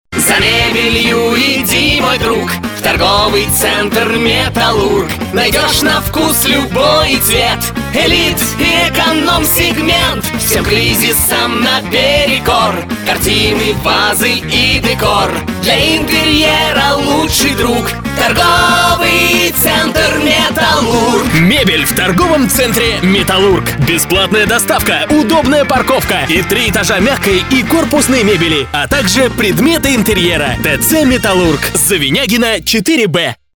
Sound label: metal